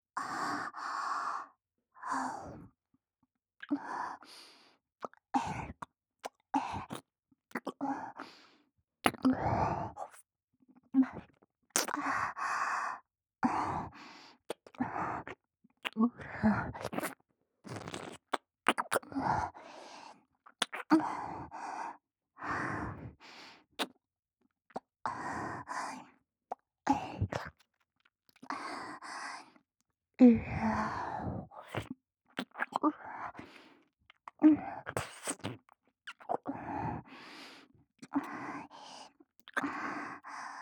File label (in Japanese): 耳舐め, ループ音声, ASMR; アイリス